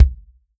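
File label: bass drum, Real Drum Kit; REAL DRUMS; Best Service ProSamples vol.43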